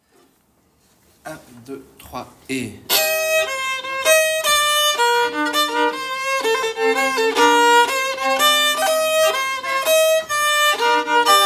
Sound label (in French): violon